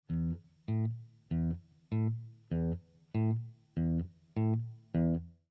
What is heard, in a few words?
Country Guitar; Lessons